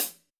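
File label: hi-hat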